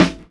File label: original snare single hit f key, snare tuned to the F note; snare drum samples